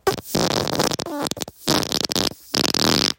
farts

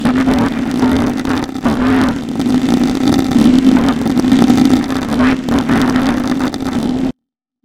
chimeric fart

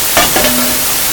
metal plus noise

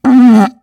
fartiest fart

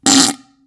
original fart

